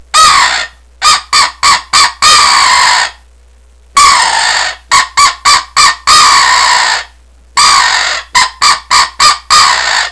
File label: crow